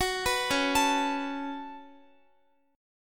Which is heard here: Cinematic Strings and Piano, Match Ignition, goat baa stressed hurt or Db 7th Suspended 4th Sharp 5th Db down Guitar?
Db 7th Suspended 4th Sharp 5th Db down Guitar